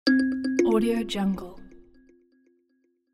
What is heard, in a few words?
new message